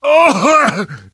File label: hurt major, survivor voice